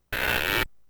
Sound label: Trapdoor; lemmings; x68000